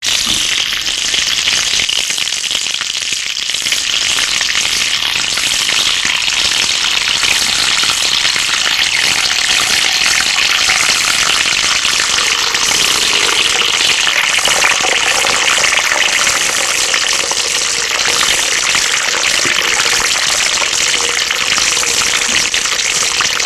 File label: bacon frying very greasy; food; sound effects; sound effects one